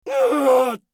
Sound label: bear death; eft